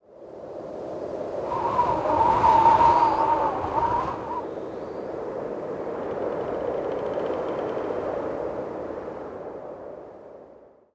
wind gust

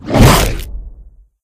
charge hit; scmod; tripodhopper

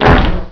fart close

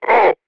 pain

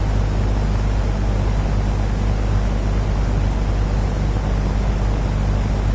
battery hum loop

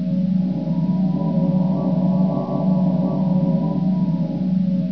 pulsing whine

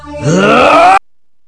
shield; broly

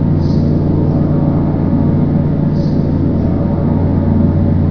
vent pump

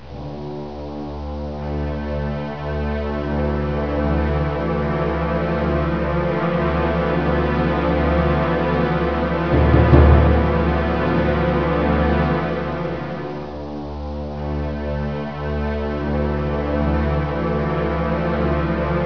Song

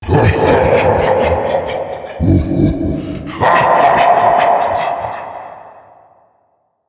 laugh

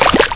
flesh

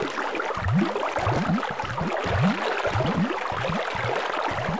sludge flow

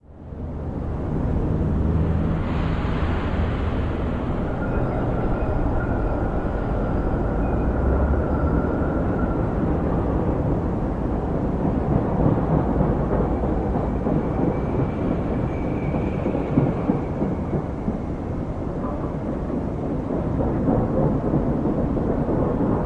cricket; kasino

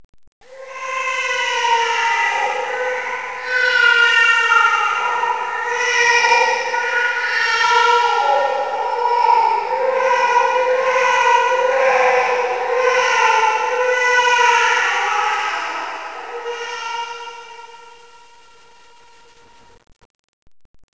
baby crying

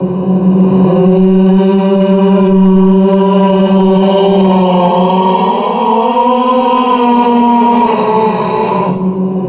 scary moan

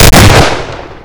dbarrel; hl2

